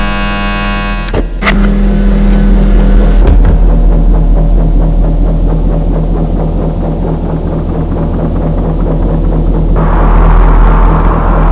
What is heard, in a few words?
washing machine